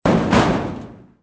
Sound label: door rattle; player; Soldier Of Fortune II Double Helix; XBOX